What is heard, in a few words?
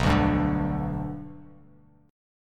B Minor Sharp 5th B down; strummed; JGuitar